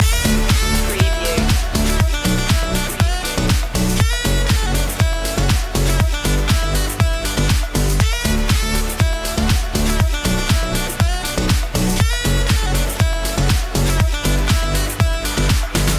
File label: sax me up loop